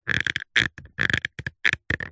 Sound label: plastic thin squeeze pressure creak; foley